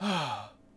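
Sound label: Longbowman Heal Vox; Civ4 warlords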